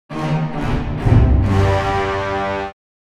Cartoon orchestral danger

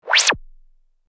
TV switched on television turn on; sitcom

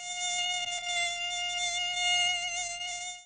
Mosquito flies by into ear

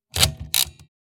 Casino game mechanical lever pull

